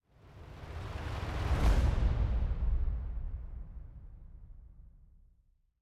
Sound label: music interactive; World of Tanks